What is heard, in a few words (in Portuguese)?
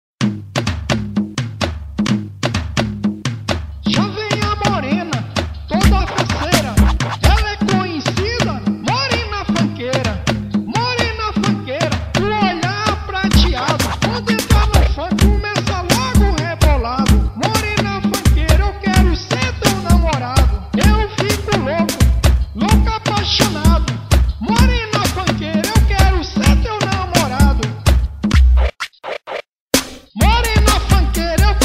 funk novo